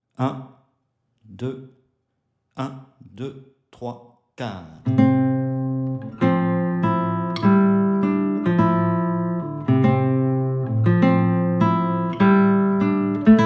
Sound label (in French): exercice 100bpm, guitare